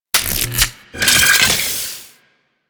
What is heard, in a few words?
Sniper Rifle Reload; Warframe; Weapons; Corpus